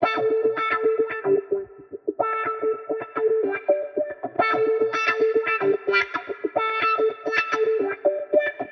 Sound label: 110 A, Spaced Out Guitars; Loops; Niles In Town; Touch Loops; CosmicFunk